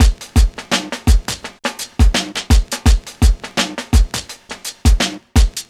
Drum Loop, Drum Loops

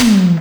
TOM ELECT; USB Soundscan vol.27; Ragga & Dub Reggae; AKAI

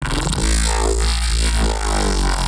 Bass Shot; neuro; BIG5AUDIO

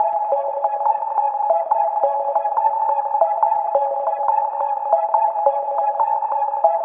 140BPM C#; Vengeance - Essential Clubsounds Vol.4

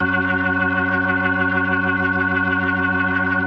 F#2F, B3, Vintage Keyboards

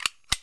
on off, Switch FX; Masterbits

